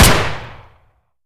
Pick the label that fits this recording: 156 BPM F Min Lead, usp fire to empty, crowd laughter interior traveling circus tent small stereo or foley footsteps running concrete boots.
usp fire to empty